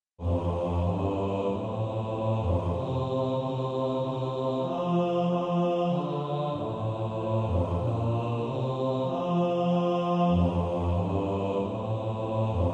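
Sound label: bass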